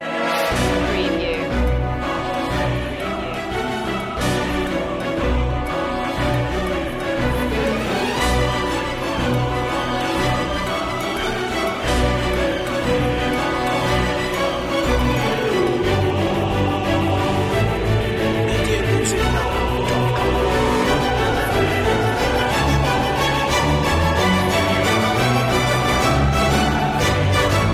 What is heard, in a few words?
no perc